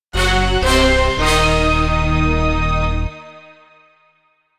Dun dun dun shock transition suspense, cartoon orchestral